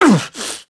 oof; Max Payne; tenements

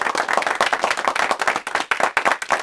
Small Crowd Clap; David Beckham Soccer; XBOX

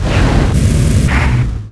turbo, ships; Quantum Redshift; XBOX; tara

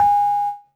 piano ab; school; Tony Hawk's Project 8; XBOX; inschool

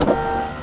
radar servo start; machines mechanical

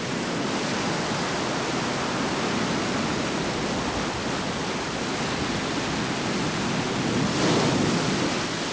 water sea; Pirates Of The Caribbean

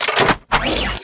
Rocket Cocking, Rocket Launcher

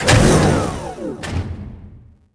door hallway; Star Wars Obi-Wan; XBOX

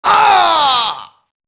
mobster death; enemy; Max Payne

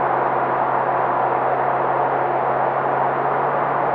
Skid Grass; Totaled; XBOX; skids

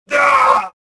pain; Star Wars Jedi Knight II Jedi Outcast; XBOX; weequay

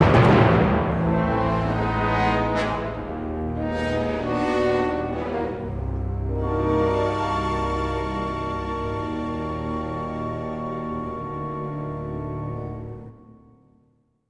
battle lose, music

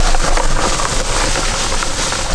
roll grass dried, terrains; Tony Hawk's Project 8; XBOX

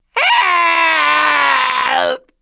knock; True Crime Streets Of LA; XBOX

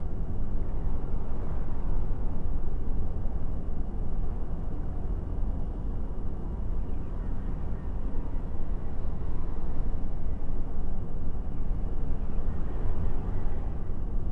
saber Arena; Star Wars Obi-Wan; XBOX

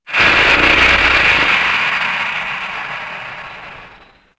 Charc Hiss, Dino

